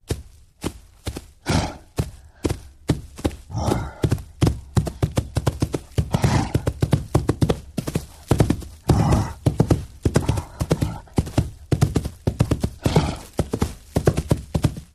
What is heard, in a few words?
Rhinoceros Chargi, animals wild rhinoceros